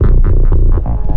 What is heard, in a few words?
102 evil dubby downstep bass